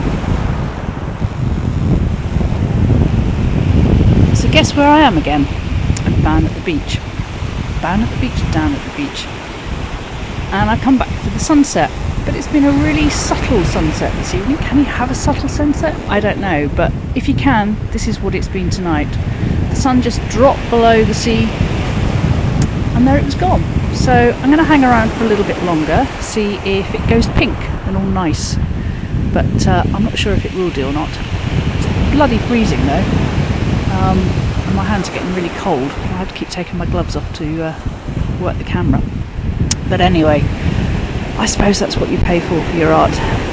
ney; subtle ney; sunset

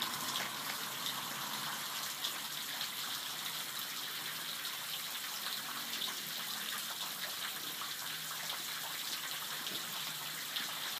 natural water

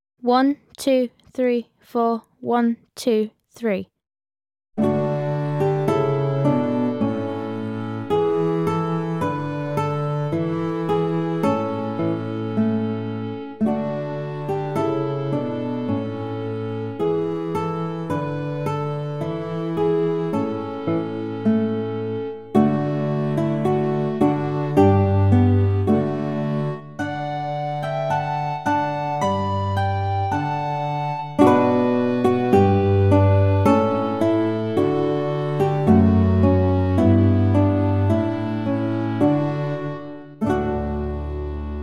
backing track; The Dancing Master

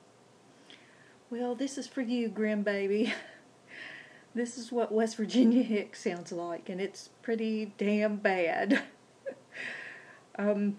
hahaha

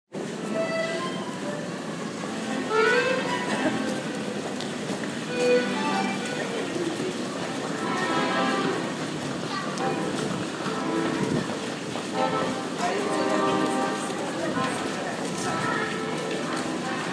Moscow subway sound

